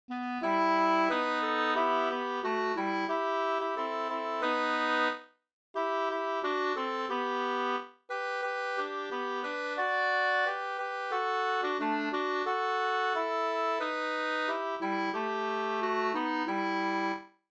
vismelodi; Den bergtagna

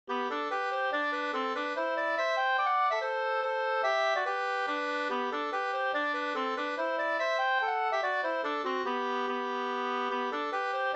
mazurka; fr Ostmark e Daniel Nilsson Sunne Nr2 BgdIN p73-3 2st

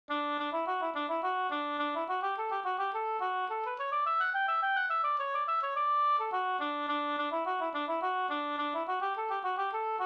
slangpolska Skraddarpolska

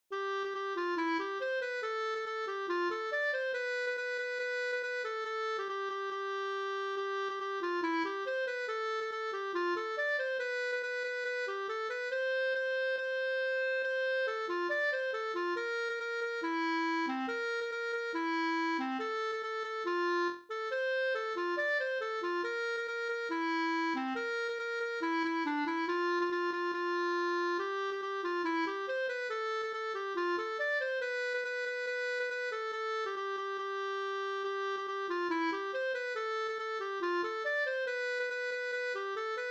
polka C F dur

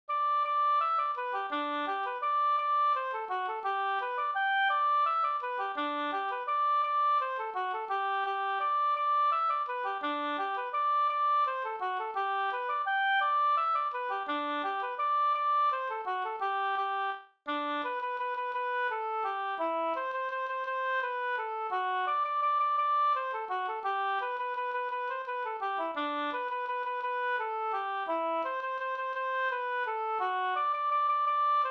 vals brudvals

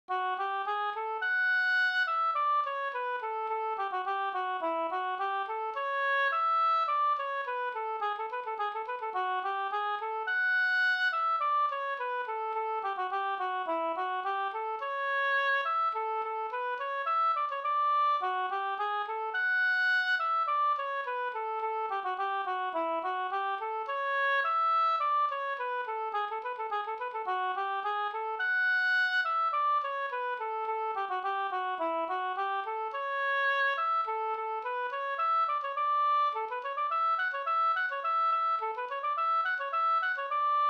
polka polkett; e Sven Hogstrom Vb utl A Risan

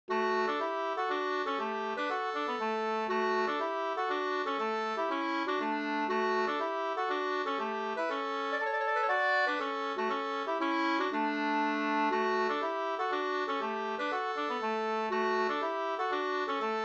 slängpolska

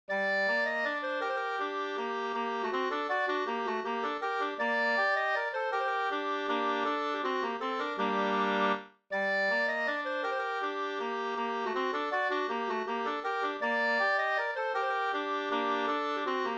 vals; Lapp-Nils; upptecknad av Gullik Falk, ur Svenska låtar, Medelpad, nr 51; med andrastämma av Herbert Jernberg

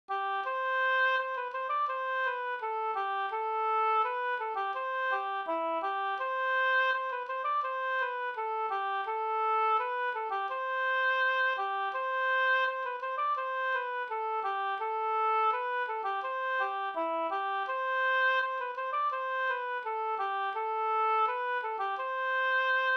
brudmarsch Ridmarsch C dur

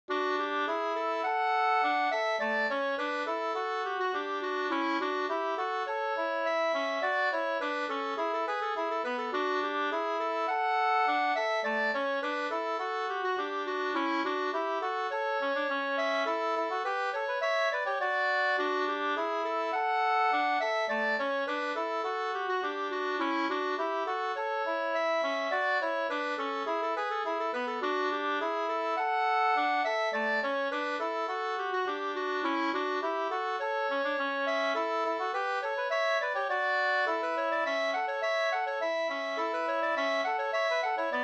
polka polkett; e Sven Hogstrom Vb utl A Risan 2st

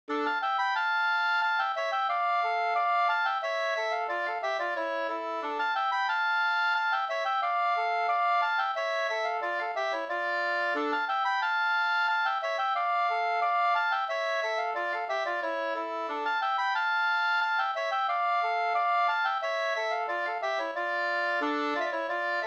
halling; fr Eksharad SvL Vr2 2st V1

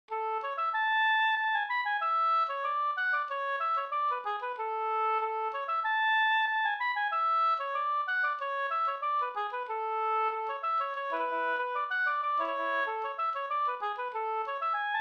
engelska

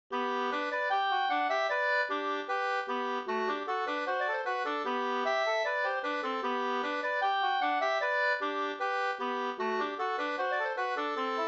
reinlender; e Edvard Soderlund Enviken Da

